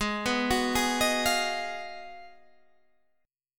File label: Ab Minor 13th, Ab, Guitar, Standard; Abm13 arpeggiated; JGuitar